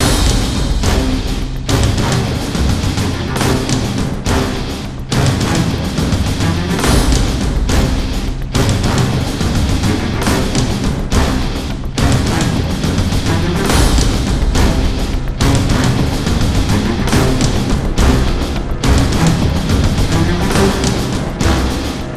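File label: full band breakdown loop; transformers war for cybertron gamerip; IACON IN RUINS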